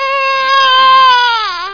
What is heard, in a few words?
baby cry